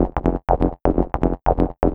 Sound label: Arp Synth, Trip-Hop; Sonic Foundry (Sony Creative Software); Rondo Brothers Trip-Hop Loops & Samples